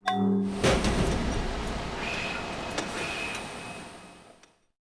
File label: Factory; Buildings; Civ4 beyond sword